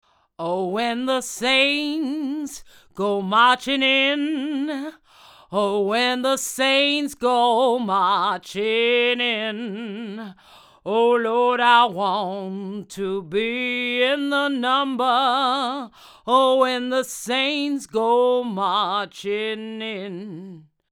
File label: Vox saints cardioid GA 47